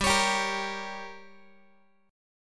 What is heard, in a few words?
G Diminished, Guitar; strummed; JGuitar